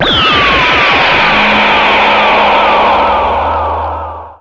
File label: final flash charge; DBZ